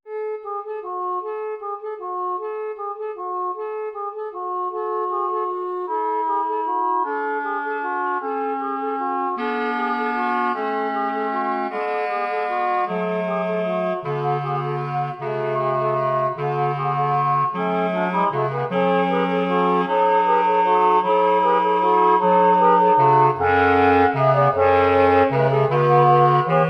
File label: Clarinet